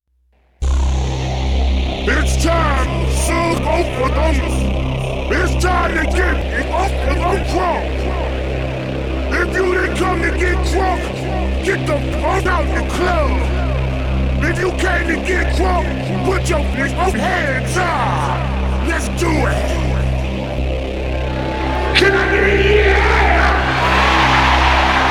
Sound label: Crunk Rock Intro